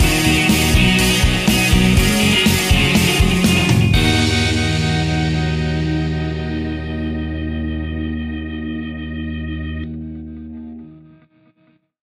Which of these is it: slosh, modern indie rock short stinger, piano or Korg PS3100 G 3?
modern indie rock short stinger